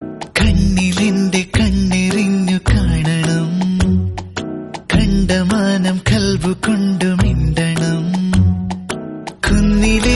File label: Malayalam Song; Marakkar